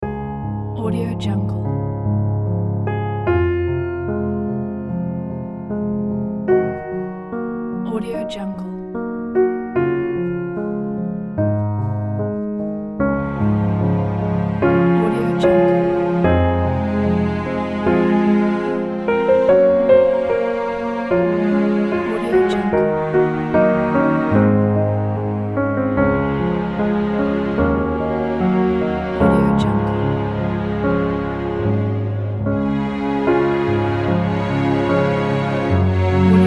inspiring romantic love

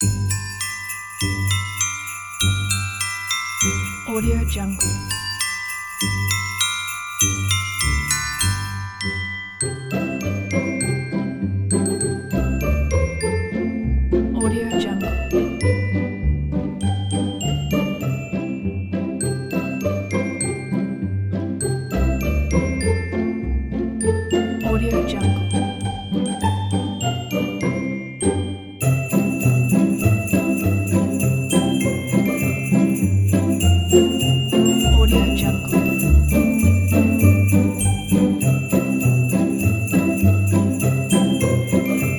jingle bells loop

